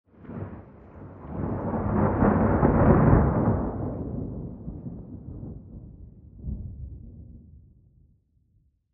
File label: Thunder Real Ambi